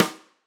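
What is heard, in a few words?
Tam Snare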